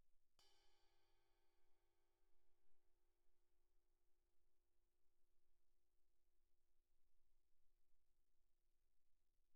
crash stick normal pp, suspended cymbals; Percussion

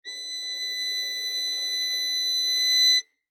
Violin arco ff sul E B6; MIS Pitches - 2014; Strings

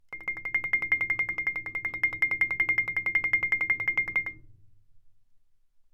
Marimba roll ff C7; Percussion

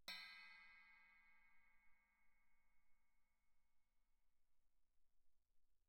chinese stick bell pp, suspended cymbals; Percussion

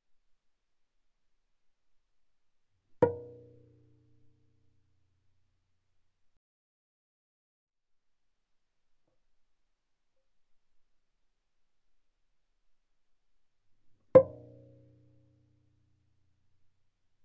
Viola pizz sul D ff C6 D6 mono

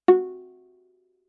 Viola pizz ff sul D Gb4; MIS Pitches - 2014; Strings

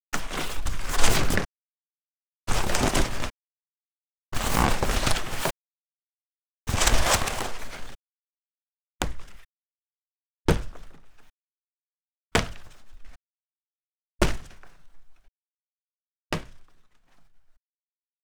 Packing Cardboard; Soundmined

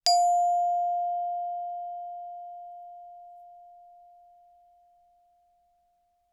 bells plastic ff F5; Percussion

